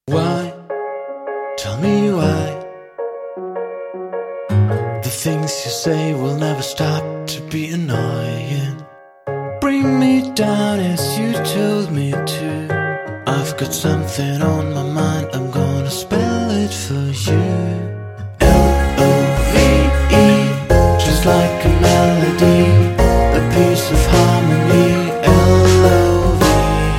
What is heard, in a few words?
Canon